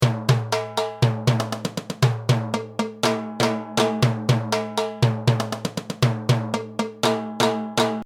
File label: Timbales non latin